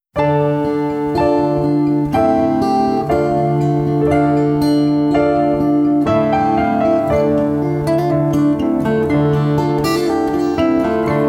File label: backing